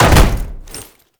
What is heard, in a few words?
Land Heavy Metal, movement; Warframe; Uriel